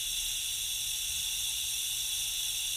Steam Hiss; hidden deep windows gamerip 2022

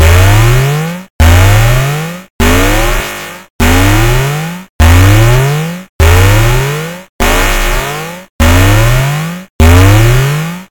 Warning; Blaster Master

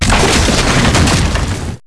break heavy Wood

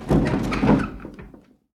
Window open; the 39 steps

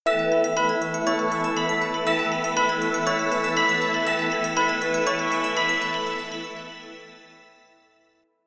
Transfer Completed; Wii System

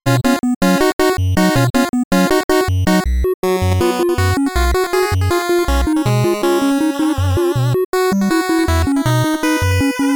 Bagpipes 8-bit Version

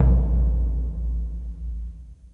surdo; Dragon Riders; Chronicles of Pern; Dreamcast

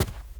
run carpet; lucius

